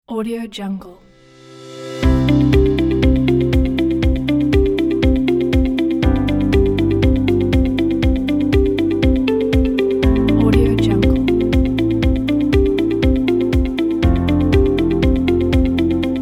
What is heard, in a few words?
full of motivation corporate pack; dailywaves